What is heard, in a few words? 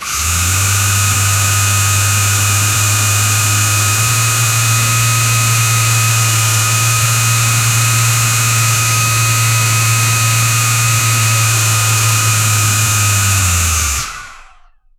20l v10 reving up, engines